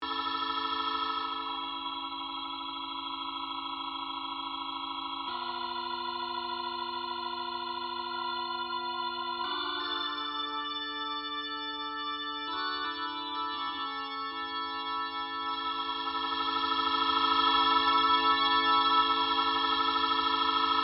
org with verb

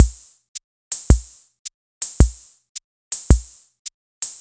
Swing

